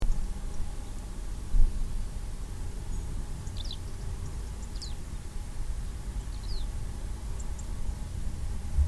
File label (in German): feldlerche